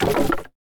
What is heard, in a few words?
firewood put